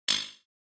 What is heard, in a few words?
Button Triple Packin; buttons